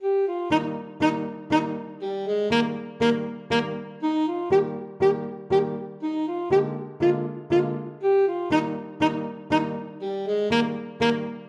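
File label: alto saxophone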